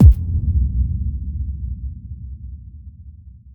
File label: Boom Reverb